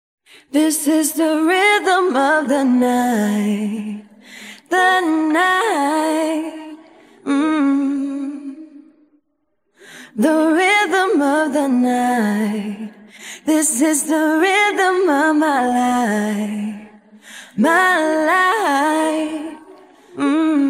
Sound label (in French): chant; demixificateur